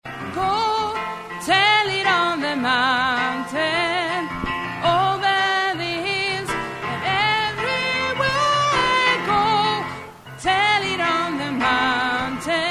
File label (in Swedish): bas